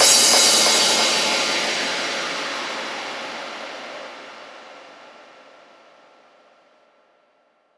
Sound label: FX